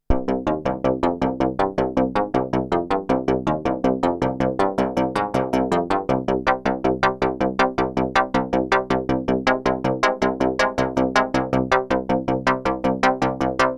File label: Sweet Spot; Pro Vs Mini